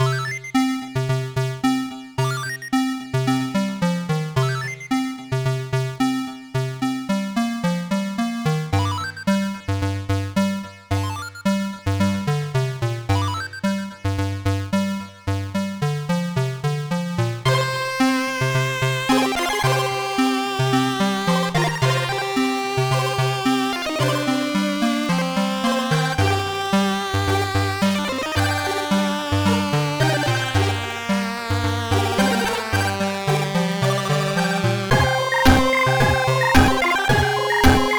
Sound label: Chiptune